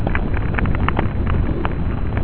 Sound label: fire; de coldfight